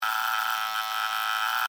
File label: Household, Door, Bell, Buzzer, Apartment, Long; Doorbells